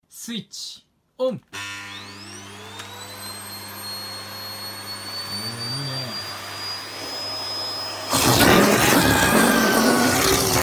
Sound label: switch on